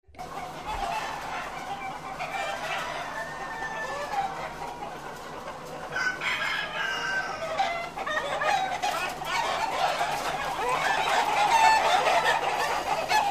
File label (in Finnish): kanat